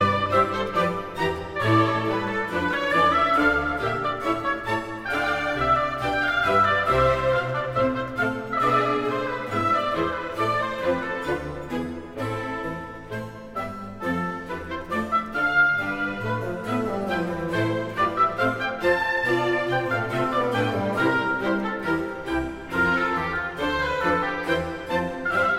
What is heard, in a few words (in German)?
konzert c dur fuer zwei klaviere adagio ovvero largo